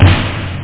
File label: snare